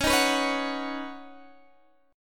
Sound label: C sharp 7th Suspended 2nd Suspended 4th, C sharp, down; strummed